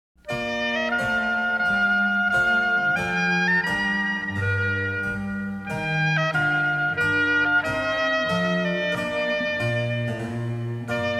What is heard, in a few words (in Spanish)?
clavecin violin y oboe